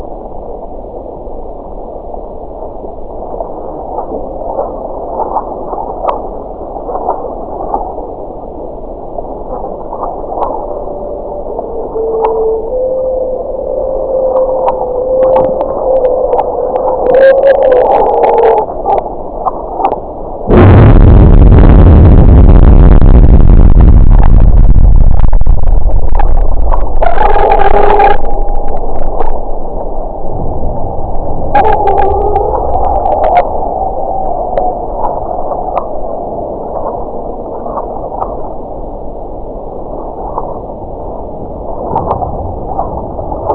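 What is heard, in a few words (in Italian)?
sonificazioni eventi sismici; Grecia Magnitudo MW 6.3 Isole Ionie 26/01/2014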